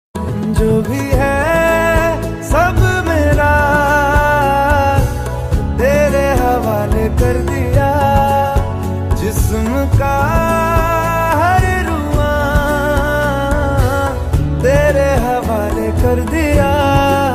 new hindi song ringtone

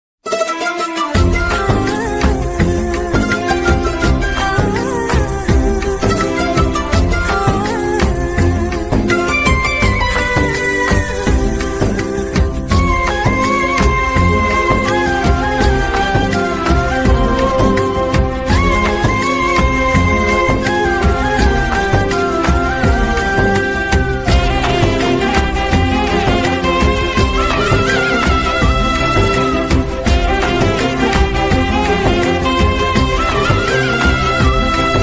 love ringtone tamil love bgm ringtones; viswasam

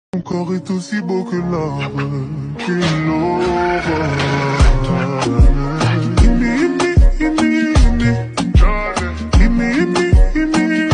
english ringtone

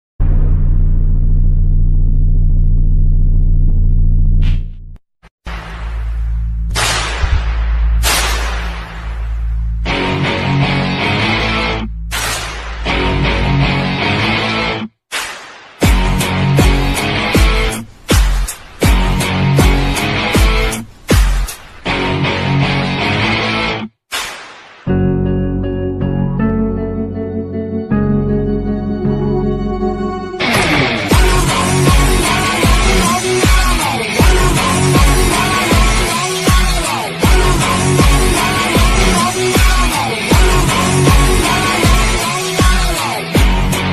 adithya varma mass bgm; dhruv vikram

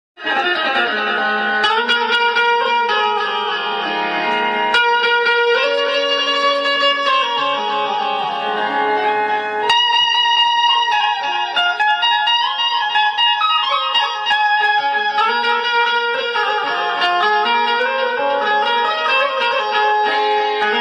instrumental music

